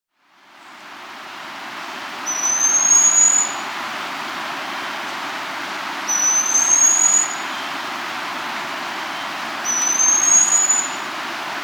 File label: Black-Streaked Puffbird; Malacoptila; S. America